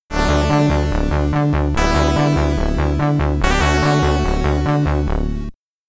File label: Game Over Restful (SID Stereo); Sky Shark; Commodore 64